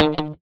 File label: guitar stab